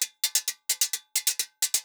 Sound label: Guira 130